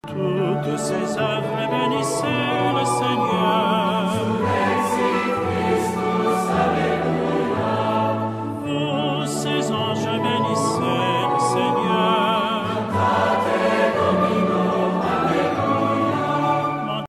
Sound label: surrexit christus solista